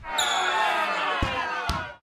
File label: Basketball crowd reaction to missed shot